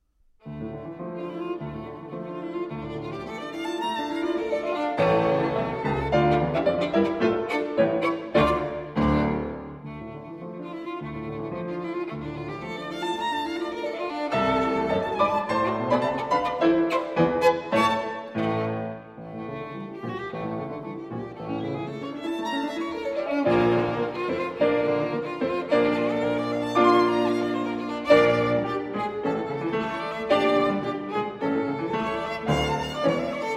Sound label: Violin Sonata in D Minor; Schumann; Violin Sonata No. 2; Op. 121